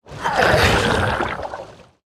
Piranha Swarmer Attack Sound

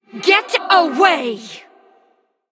female ghost, Attack

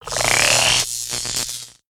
Shock Hopper Attack

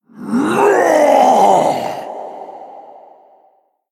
Male Spirit Death

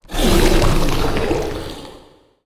Horrendous Growth Corrupted Death

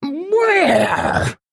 Female Bear Attack

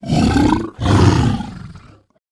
Stegodon Play

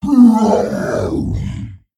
Death

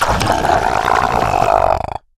Magic Dart Frog Death

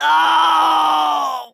Death

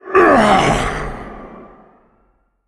male elemental, Death

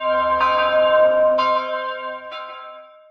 White Bishop Underlay Play

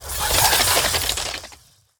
Spiderbomb Attack

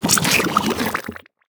Toxic Sewer Ooze Attack

